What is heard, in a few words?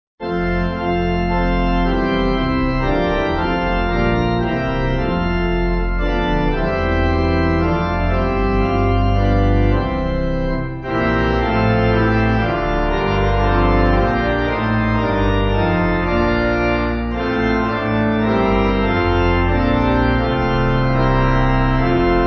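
Pipe organ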